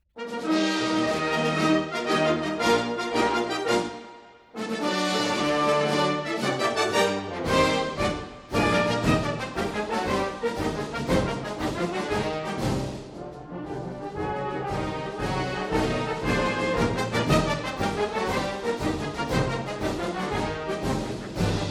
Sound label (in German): Marsch